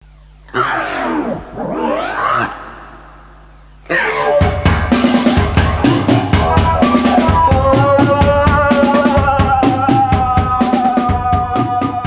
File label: AAAARRRRRR